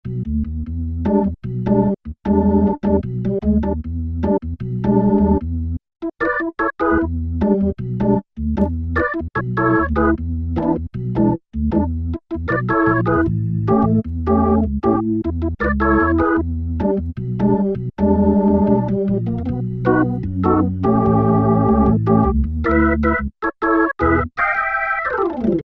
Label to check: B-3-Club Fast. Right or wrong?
Right